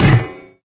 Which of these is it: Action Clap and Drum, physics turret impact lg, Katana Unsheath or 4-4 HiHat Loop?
physics turret impact lg